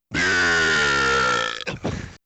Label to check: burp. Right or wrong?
Right